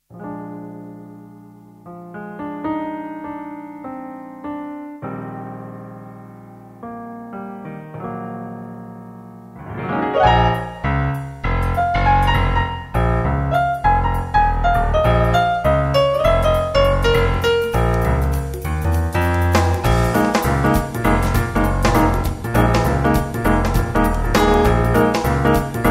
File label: groove piano